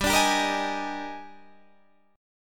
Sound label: Eb Major 11th G, guitar